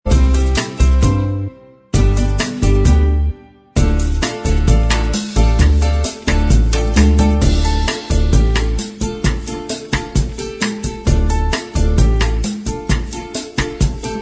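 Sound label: bossa style instrumental track, backing tracks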